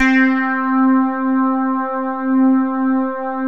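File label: SOLID STATE, BASSES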